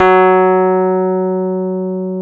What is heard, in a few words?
Electric Pianos; Wizoo